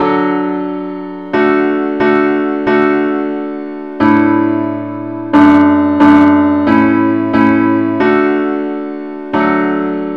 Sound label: parts only key d